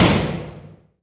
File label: Backboard Hit